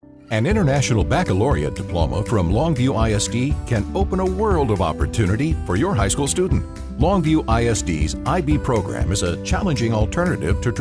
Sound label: radio original music